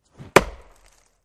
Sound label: Ax Wood Single Chop Fa, axe hits